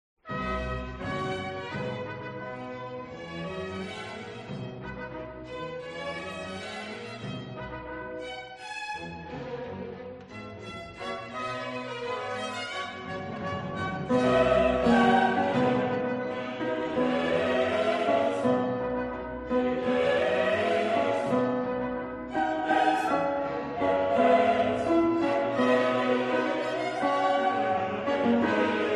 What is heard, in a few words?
Kyrie Tenor; spatzenmesse